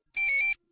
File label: nokia old tone